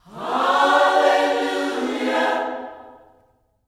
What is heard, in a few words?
HALLELU, Vocal Planet, Gospel; HALLELUJAH